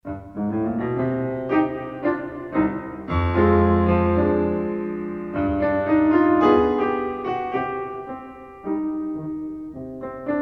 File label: Tango in C minor; Marlais Book 4